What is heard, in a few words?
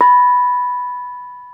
BONANG HI, Bronze Gamelan; E-MU Formula 4000 Series Vol. 4; Earth Tones